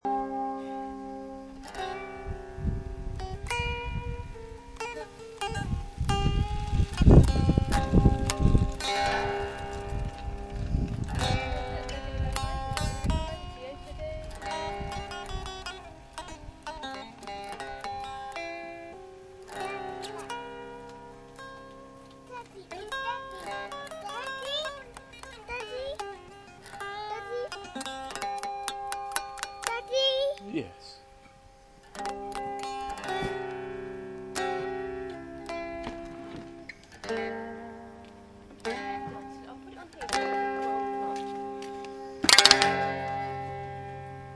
72 reissue strat in the garden with interruption from son